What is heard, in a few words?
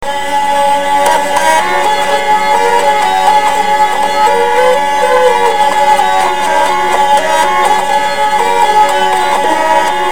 hurdy